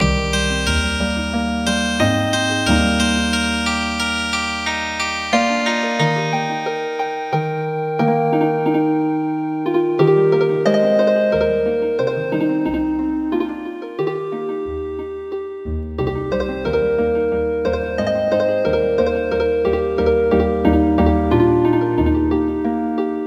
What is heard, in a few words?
Healin Harp